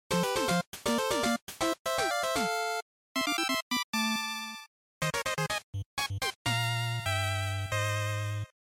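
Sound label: Game Boy Action, bgm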